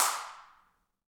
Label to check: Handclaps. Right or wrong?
Right